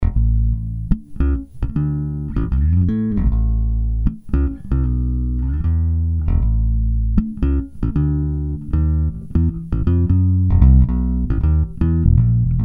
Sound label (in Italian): basso fx; RME Fireface UCX